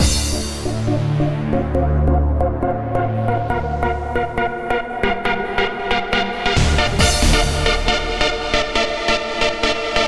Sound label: NRG Lead, trance